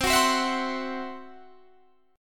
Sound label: C-Major Flat 5th, Guitar DADGAD